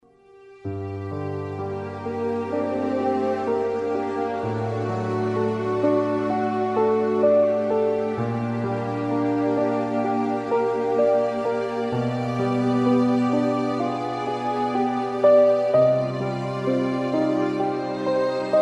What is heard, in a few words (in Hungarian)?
Instrumentális mix; IMATARSOLY-ZENE